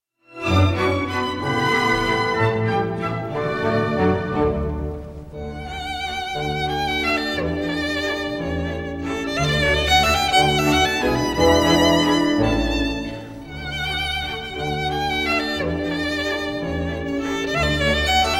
walzer a la paganini for violin and orchestra op 11